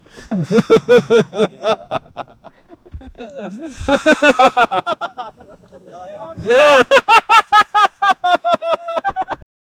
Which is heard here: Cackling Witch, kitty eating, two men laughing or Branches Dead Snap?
two men laughing